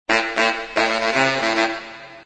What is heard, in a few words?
jazz